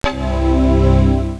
Quadra startup sound; Handbook Interactive 1.0

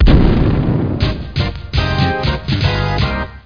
game over

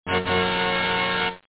TADA

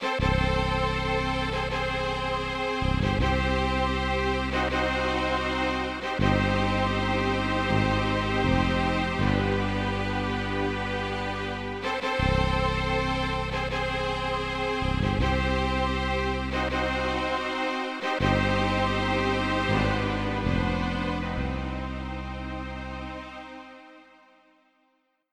Game Lose

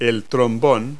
trombone

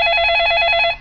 ring

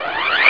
SAW ON

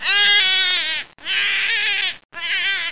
crying

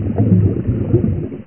BUBBLE

